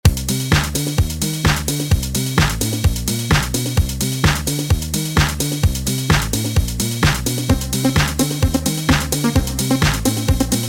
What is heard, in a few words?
A Simple Disco Beat